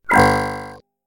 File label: Error Sound